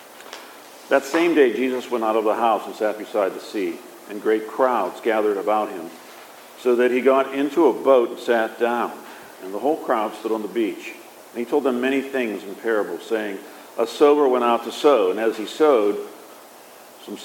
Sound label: Gospel